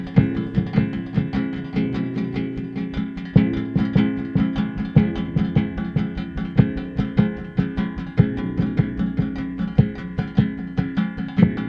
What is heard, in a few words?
Brushes